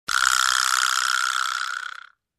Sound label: Gear rolling sound